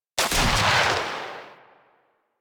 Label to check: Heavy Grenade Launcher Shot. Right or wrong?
Right